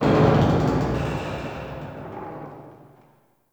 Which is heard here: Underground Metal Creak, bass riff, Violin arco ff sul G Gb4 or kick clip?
Underground Metal Creak